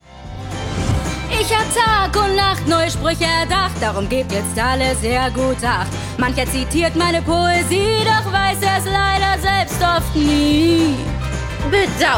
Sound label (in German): Song